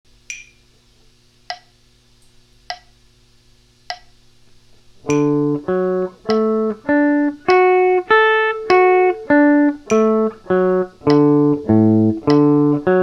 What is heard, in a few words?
caged a form arp, guitar